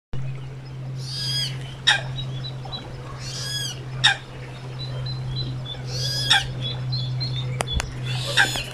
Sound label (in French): foulque et bb